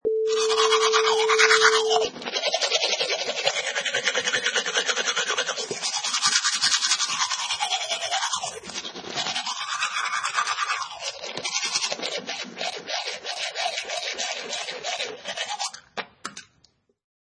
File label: Brushing Teeth